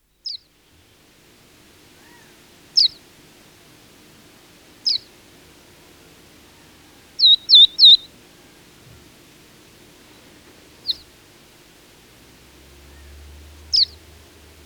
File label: bird; Project Zomboid v41.73